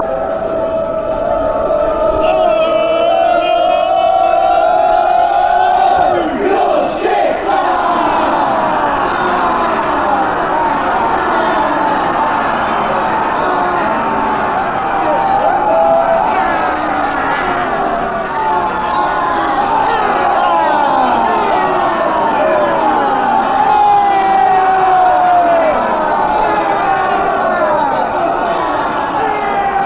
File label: goal kick fanchants